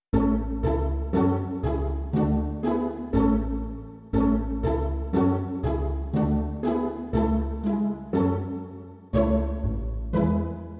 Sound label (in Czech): smyčcový orchestr - pizz.; Svatý Bože lásky plný; Imaginativní verze